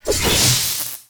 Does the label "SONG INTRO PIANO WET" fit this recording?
No